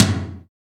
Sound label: verby tom one shot f key, Royality free tom drum sound tuned to the F note. Loudest frequency: 2229; tom drum samples; one shots